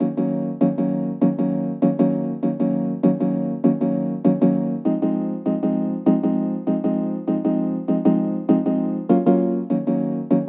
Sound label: 99 BPM A# Min Keys; Loop Stems; Cymatics; Infinity Melody Collection; Feeling Good